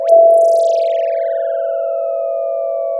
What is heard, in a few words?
Sound of a phone ringing